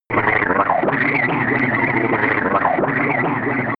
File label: earth miserable radiofrequency connection